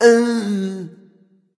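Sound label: non-verbal tongue; smite; voicepack; houyi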